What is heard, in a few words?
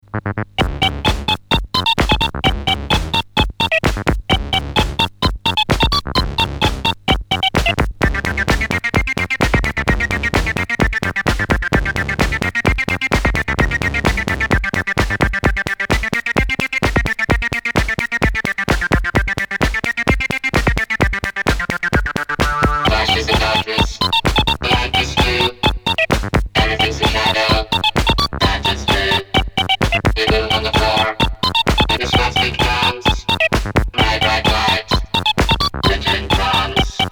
machine pop